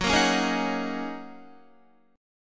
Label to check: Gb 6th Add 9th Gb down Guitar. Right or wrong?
Right